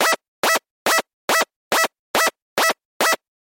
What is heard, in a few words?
Zapper Alarm